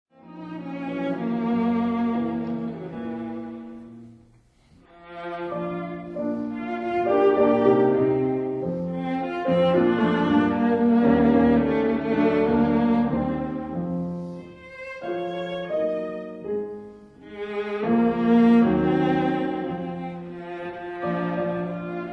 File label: Sonata for Viola and Piano in E flat Major Allegro amabile; Brahms; Op 120 No 2